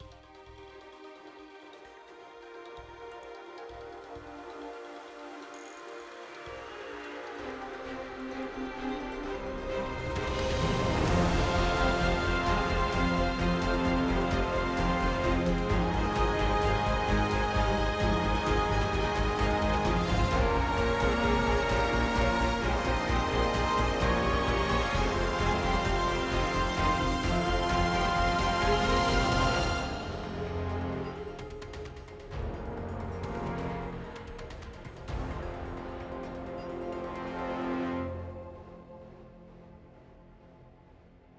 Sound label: BGM Ingame Battle Start